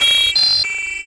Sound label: MET SW SIN, SWORDSING